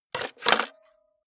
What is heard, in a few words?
cassette into cassette player hard